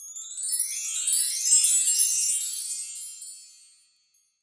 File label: Wind Chim, Roland SPD-S